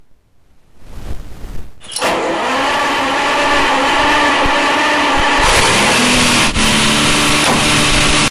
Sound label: Old Starter, ferrari 330gt; Kerry's 330 GT Restoration